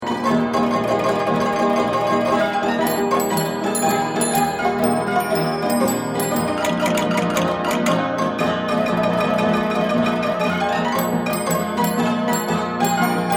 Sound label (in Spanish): organillo